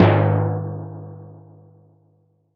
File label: Timpani, Percussion